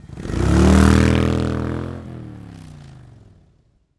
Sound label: motorcycle away; anemoi